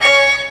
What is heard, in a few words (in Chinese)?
乐器; 潮汕英豪传